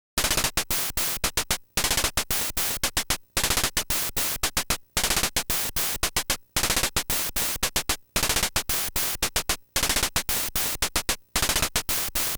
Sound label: Bonus Stage; Musical Instrument 1~2